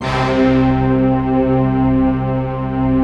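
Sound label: staccato orchestra, C3; Best Service; Advanced Media Trax Modern Composer; STACCORCH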